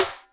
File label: Cap Rim, Electric Snare, Yamaha DTXpress